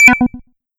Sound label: Click Button; HardPoint; Abertay University; Unity3D